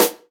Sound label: old school noisy snare sample a sharp key